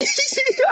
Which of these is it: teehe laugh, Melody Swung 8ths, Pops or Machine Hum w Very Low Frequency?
teehe laugh